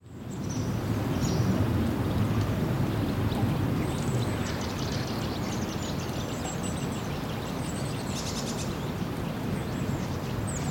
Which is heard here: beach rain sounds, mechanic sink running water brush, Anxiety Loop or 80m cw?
beach rain sounds